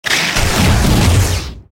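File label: Lizard buff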